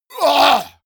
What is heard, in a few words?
monster growl attack throaty; schreie